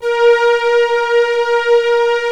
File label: VOY8 STRING, VOYETRA 8; Greytsounds VOL-1